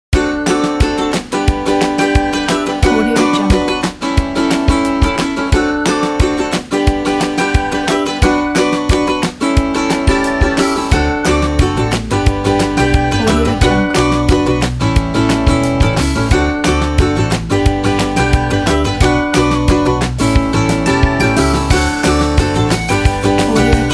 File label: lively